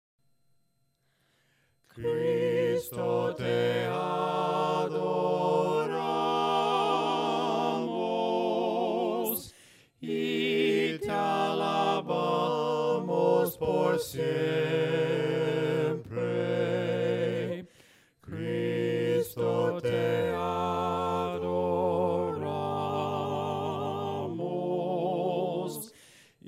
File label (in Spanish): CRISTO TE ADORAMOS VOCES, himnos a capella; cantos del camino